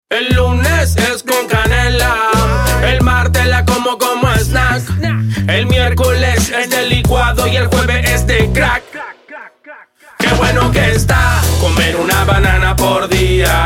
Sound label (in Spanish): HIPHOP Tropical Argentina jingle